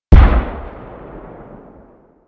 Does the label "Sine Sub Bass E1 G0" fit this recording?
No